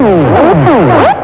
FLUBBER; EFFECTS

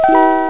chord

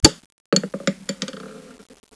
PENCIL

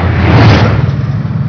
GO FAST, TRANSPOR

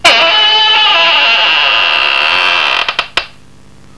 SQUEAK

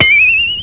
PING

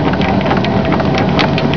WINCH, MACHINES

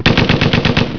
MACHGUN; WEAPONS

